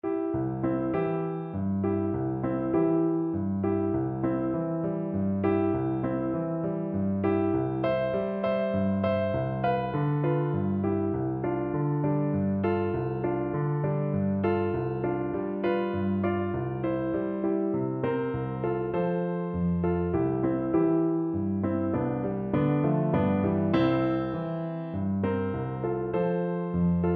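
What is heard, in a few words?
vibraphone, Percussion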